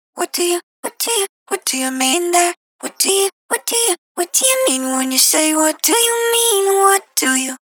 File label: 90 Dry Loop Cm